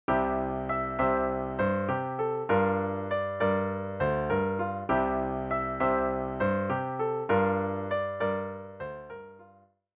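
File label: vamp, piano rendition of the chords, C Gm7; song intro; 2-Chord Vamps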